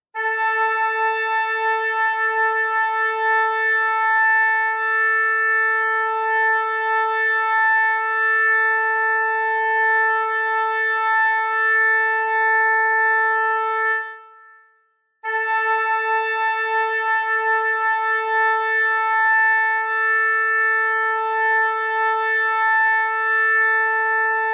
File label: 440 Oboe tuning note